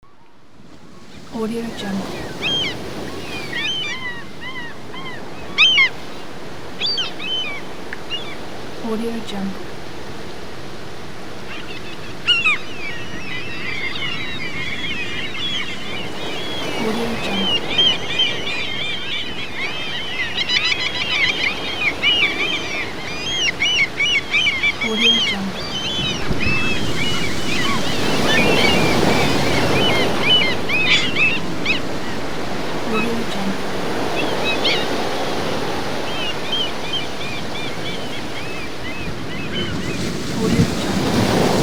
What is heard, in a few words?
sea ocean with seagulls